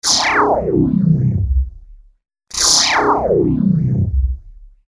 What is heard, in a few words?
finished lazer